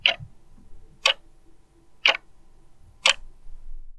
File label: clock; Untitled Maze Game